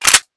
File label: slide back, deagle; cstrike